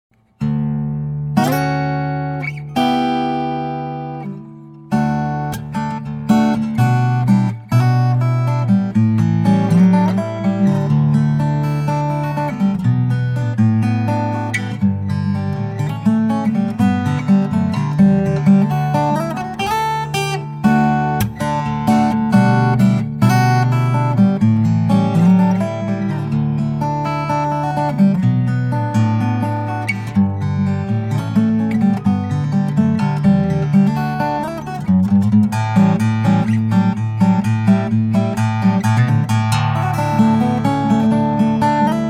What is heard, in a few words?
Guitar; Rosewoods